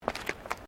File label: FOOTSTEPS THONGS (FLIPFLOPS) WOODEN DECK STEP; Footstep Foley; Footsteps (Sound Effects:Human)